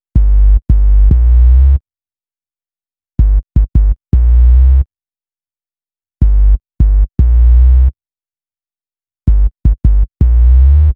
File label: Bass, Hip-Hop